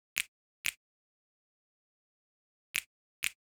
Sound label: Snaps, Hip Hop Exotica, 093 Amin; Big Fish Audio